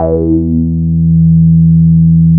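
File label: C3, major minor violin trill; INIS - Vintage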